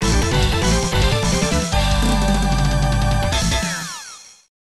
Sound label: Victory or Defeat; Saturday Night Slam Masters; Arcade